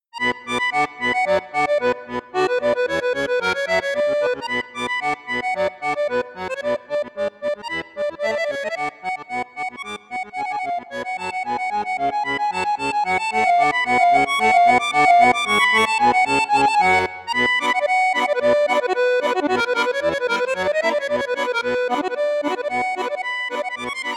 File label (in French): registre flute juste, saltarelle accordeon; registre flute boite; enregistrements audio réalisés par Bernard Busch; extraits sonores de démonstration pour cet accordéon